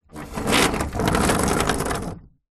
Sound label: household gate driveway wooden roll open or close; zapsplat